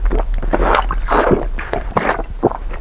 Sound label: amoeba